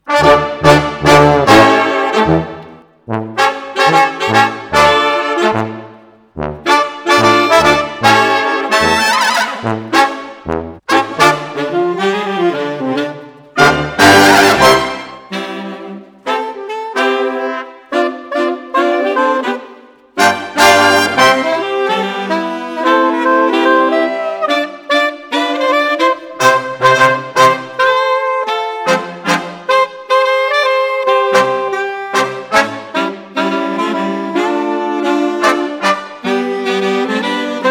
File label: Brass, Jazz for piano